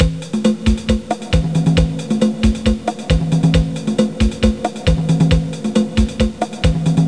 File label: Merengue, DRUMMACH; Leonardo The Inventor; Broderbund